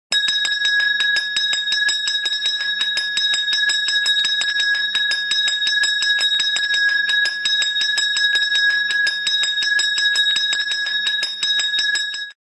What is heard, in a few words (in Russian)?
Kolokolchik shkolny zvonok; Колокольчик — школьный звонок